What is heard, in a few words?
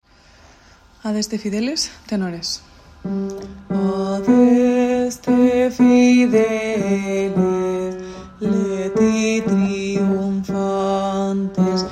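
adeste tenor; Adeste fideles; Orquesta JOCSMAB. Concierto Navidad en el Auditorio Nacional; Navidad JOCSMAB 2023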